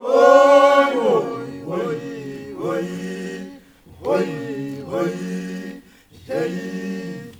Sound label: WHOI WHOAA, CHOIRS; Spectrasonics; Heart of Africa